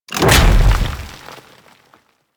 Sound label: Thumper Bot Stomp A; Warframe; Grineer